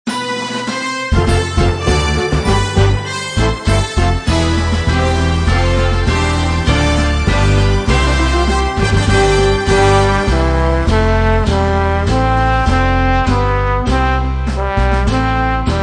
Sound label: Instrumental Hymns